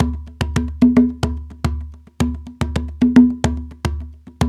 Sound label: TONGA; Spectrasonics; Supreme Beats; African Contemporary